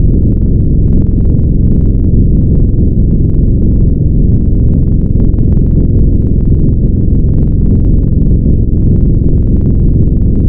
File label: Spectral Low E, wavetables; Architecture 32